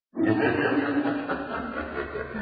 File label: laugh; surf castle-escape